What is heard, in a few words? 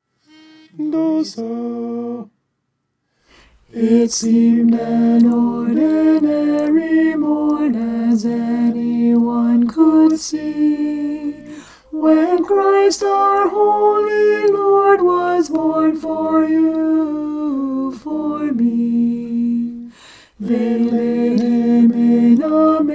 alto